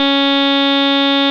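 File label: OB8 organ F#2, OBERHEIM OB8; Big Fish Audio - First Call Vintage Keyboards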